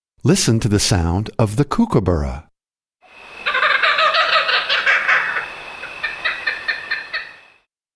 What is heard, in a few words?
Kookaburra Sound